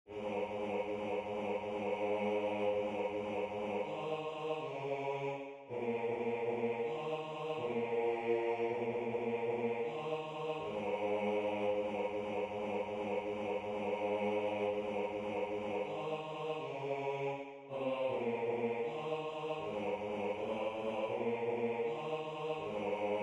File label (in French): basse; vive le vent; satb arr. james pierepont